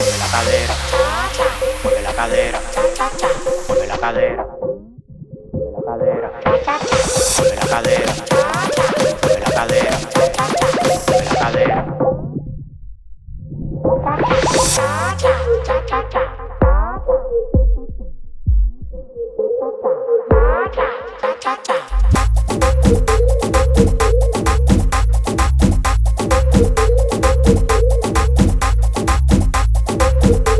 Cha Cha Cha Mashup 130 Bpm

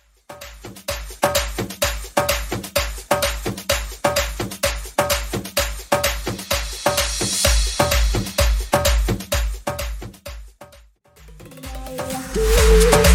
Tribal Drums

